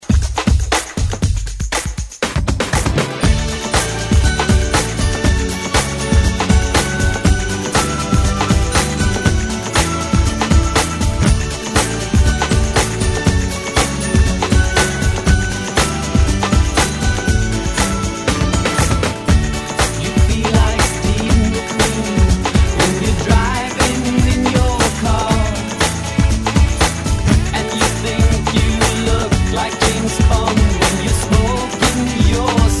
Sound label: ReDrum, 119 Bpm; DJDOLLS